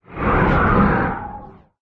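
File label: Movement Void; smite; god bakasura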